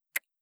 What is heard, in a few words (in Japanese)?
ちゅ, ASMR